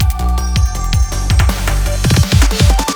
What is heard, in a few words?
Snow Transition; sky rogue